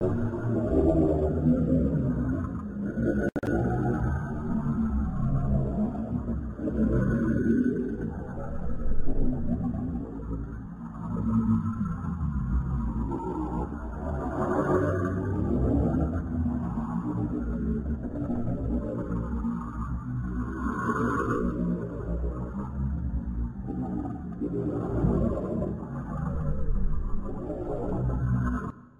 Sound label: Signal